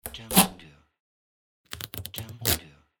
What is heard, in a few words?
Sticky Tape